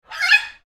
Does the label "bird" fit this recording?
Yes